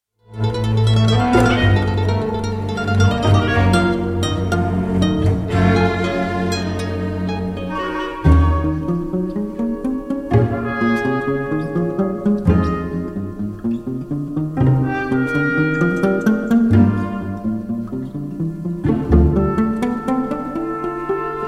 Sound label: concerto for guitar and small orchestra allegro; Sir Malcolm Arnold; op 67